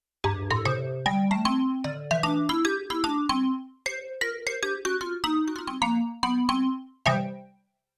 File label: Octave Marimba; Yamaha TX16W; Orchestral Performance 1